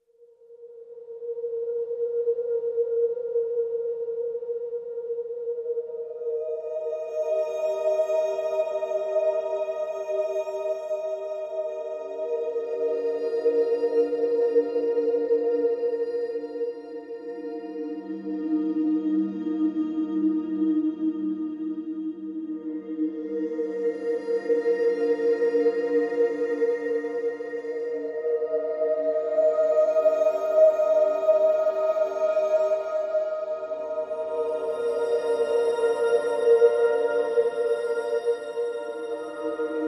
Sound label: Pads Only